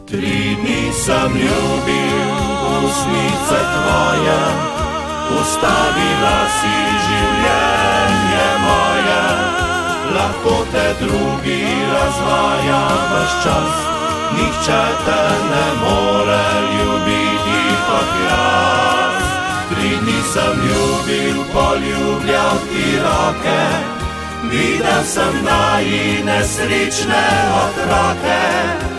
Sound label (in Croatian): klapa